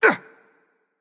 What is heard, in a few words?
gruntjump; smite; voicepack; mercury